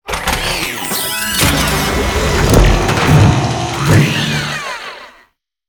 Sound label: scoop retract, ships; Cosmic Rage; ships misc